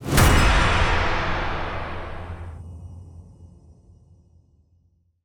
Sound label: stacked Symbol Transform; netent